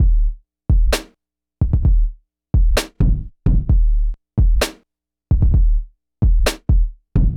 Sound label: DRUM LOOP